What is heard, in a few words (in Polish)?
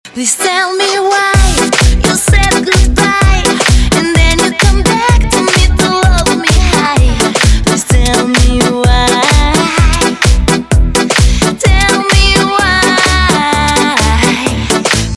klubowe zm